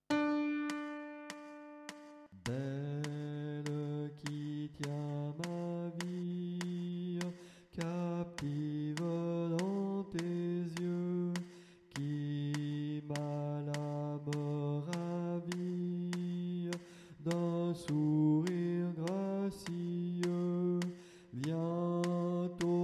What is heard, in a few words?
alto